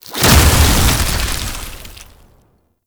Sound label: Snow Globe Spawn; Warframe; Nineteeen Ninety Nine; Deep Archimedia